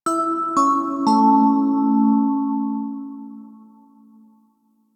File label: Train Room Open Jingle; Warframe; Ambience; Entrati